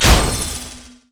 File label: arcthrow miss; LoL; diana skin02